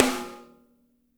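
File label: piccolo snare left, NATURAL KIT; Spectrasonics; Burning Grooves CD2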